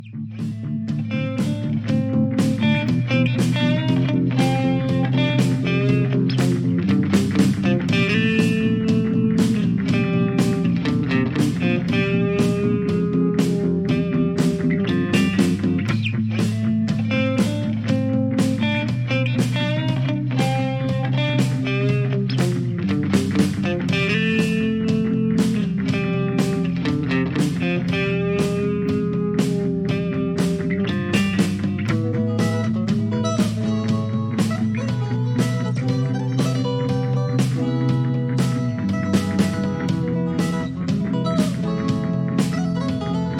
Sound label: Space Electric Guitar